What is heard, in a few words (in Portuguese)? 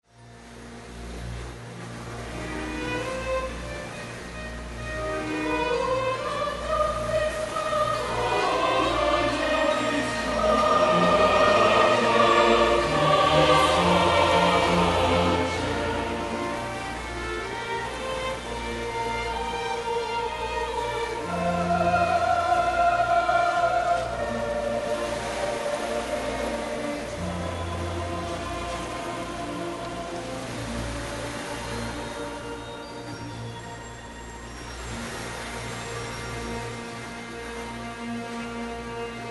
Concerto para piano e oceano